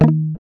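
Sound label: GUI D#, acoustic guitar; Best Service Gigapack 2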